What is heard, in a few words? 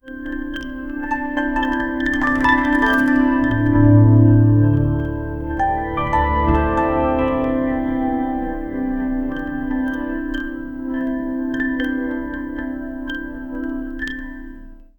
create soft calm slightly, children's